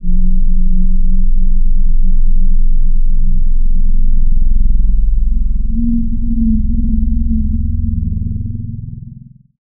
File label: Dark, Deep, Dark